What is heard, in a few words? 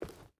footstep rubber boots forest walk; Rust